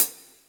high hat sound f sharp, Royality free hat sound tuned to the F# note; hat samples